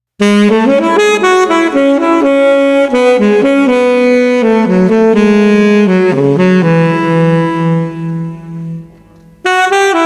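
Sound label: Street Sax Serene Melody, 120 bpm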